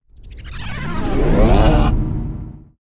Sci Fi Transition

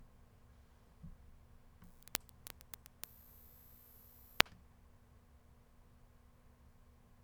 Electricitiy Pop

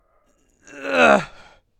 Argh

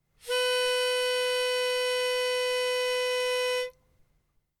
Guitar Pitch Pipe B3

